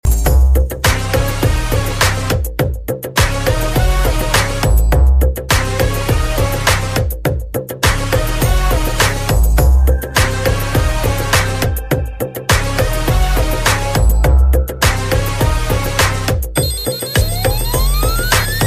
After Party Beats

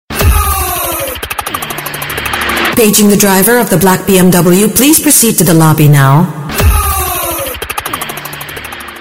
Bmw Sms Tone

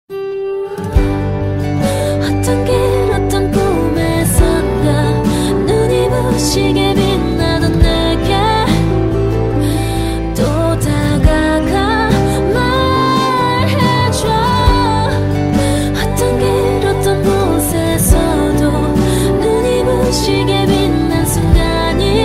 Winter Breath

